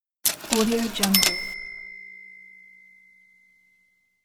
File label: cash register